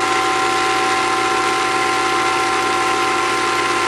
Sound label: flakgun spin, weapons; tf2classic